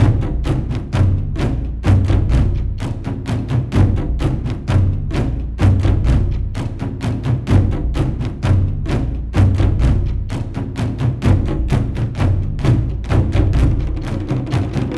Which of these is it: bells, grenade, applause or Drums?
Drums